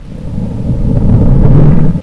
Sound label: pipe rumble